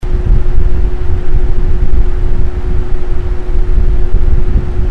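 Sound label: Bare Mic Medium; K-Tek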